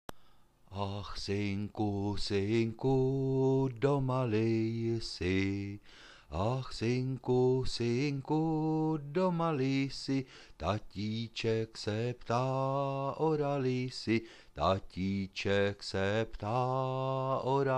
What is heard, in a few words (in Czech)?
ach synku synku